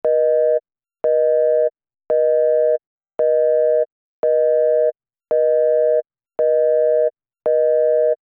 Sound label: busy; drop phone